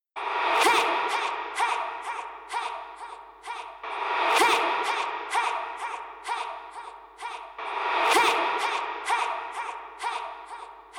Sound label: Vox Hey